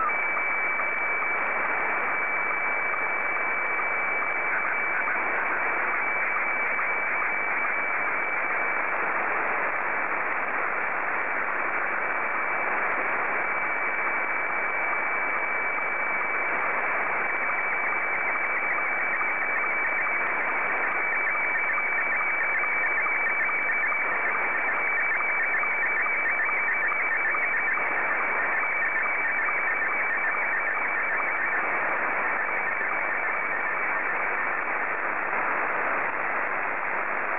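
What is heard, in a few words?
SSTV, Scottie 1; VK2TUA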